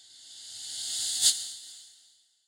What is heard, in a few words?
Woosh L to R